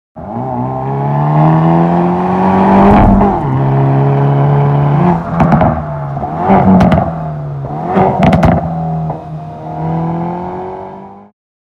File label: Veloster N